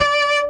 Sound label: orchestra string hit D